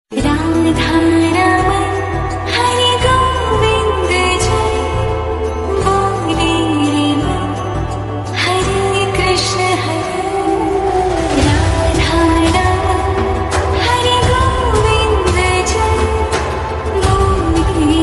Krishna bhajan ringtone, lofi version, bhakti ringtone